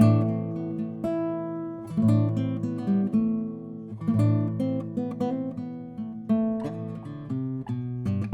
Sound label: Classical Guitar